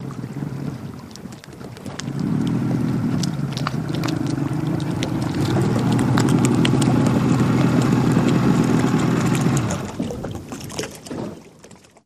150 hp Mercury Boat Pull Up Shut Off

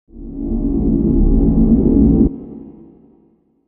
Eerie Data Scan Fade, stinger

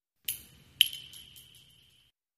Shell Casings Single

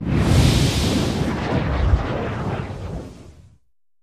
Dragon Fire Whooshes; No Crackle Element X 10

